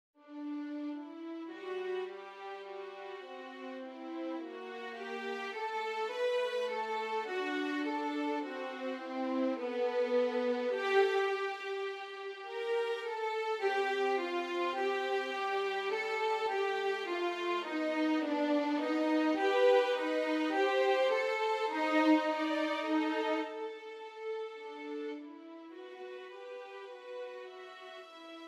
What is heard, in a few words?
Duo 2 violins